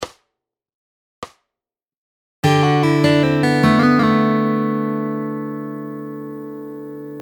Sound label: Fingerpicking Lick Variation; Fingerpicking Fun